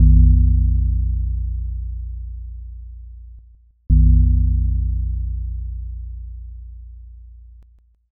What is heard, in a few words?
belltoll